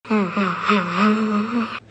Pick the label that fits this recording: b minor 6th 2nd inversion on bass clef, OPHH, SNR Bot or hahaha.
hahaha